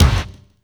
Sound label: BD, 170 BPM; Ueberschall; Drum & Bass Resonance